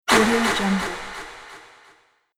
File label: impact reverb echoes